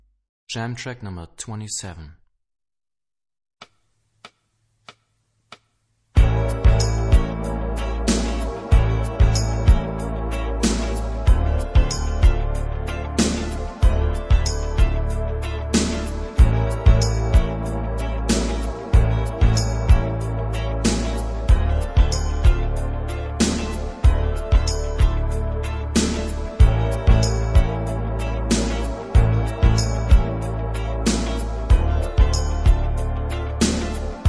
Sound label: Rock guitar; Rock gt Secrets